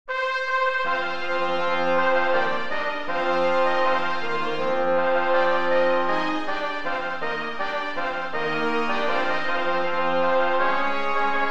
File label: Fanfare